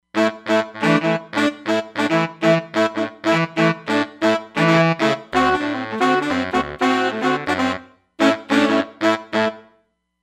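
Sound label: Sax Section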